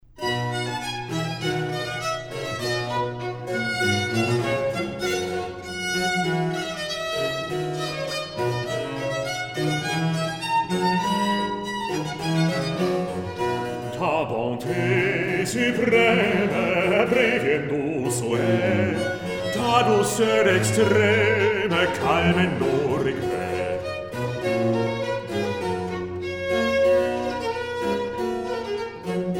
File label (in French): Air; Bacchus